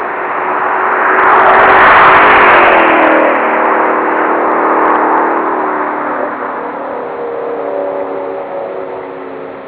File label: fly by